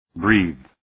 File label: breathe